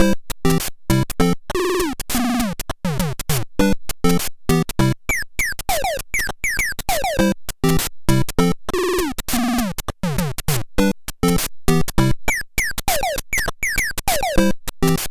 Bonus; super mario maker 2; SMB1

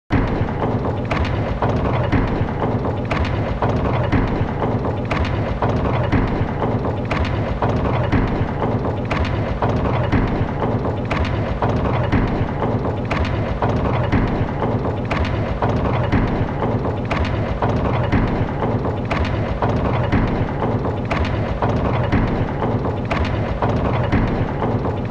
Cogwheels (Ambience); the great ace attorney chronicles

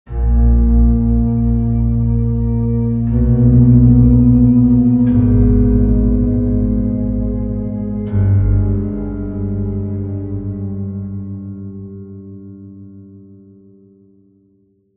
Dark piano Sample

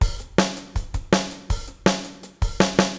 Drums

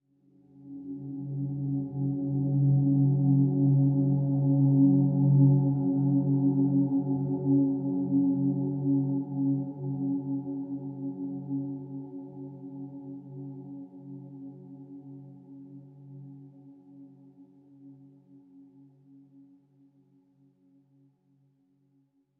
Swell Tone; HDD Theme Scull Swell Tone 04c; inside

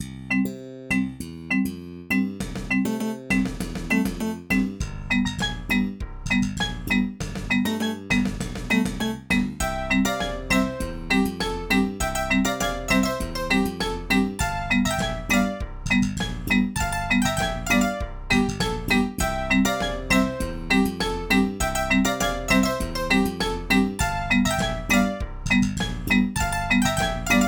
Roland SC-88; SimCity 2000

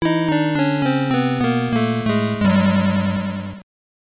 Jingle; masakari densetsu kintarou action hen; gb

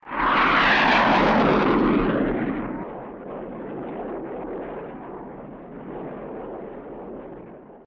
Sfx Plane; Pizza Tower early build test ost

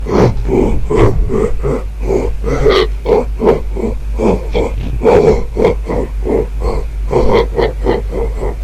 walk

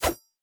Melee; boomerang fu

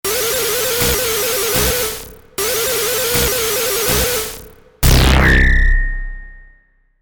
Warning; Space Invaders Infinity Gene

